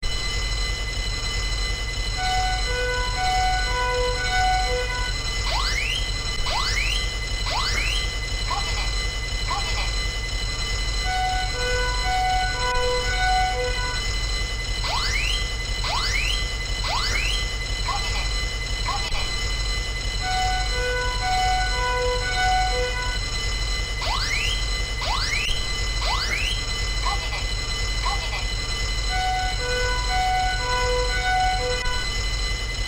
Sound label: fire alert